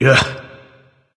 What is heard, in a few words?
"ugh"; smite; voicepack; ullr